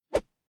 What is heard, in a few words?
Whoosh Swish Sweep Small Bamboo Swipe Sword; Staff And Rod Sound Effects; Staffs and Rods